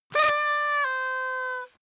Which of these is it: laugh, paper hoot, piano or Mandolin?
paper hoot